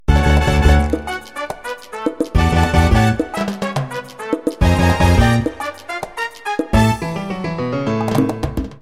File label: jingle